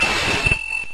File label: Throat Chop